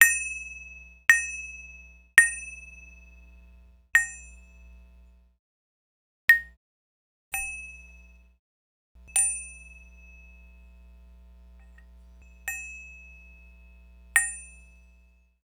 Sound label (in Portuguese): Cumbuca